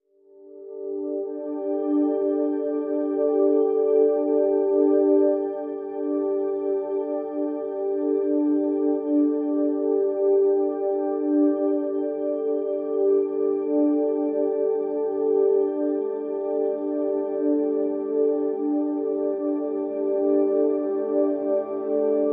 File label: Pads (G)